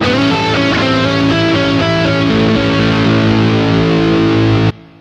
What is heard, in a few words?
blues basis, guitar